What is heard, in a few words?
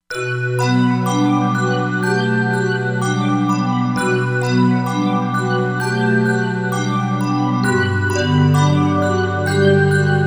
Chiffers, Korg MSC-07S Synth combination; Korg MSC-07S Synth 2